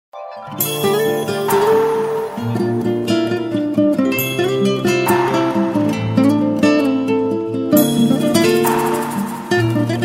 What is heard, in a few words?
Cool guitar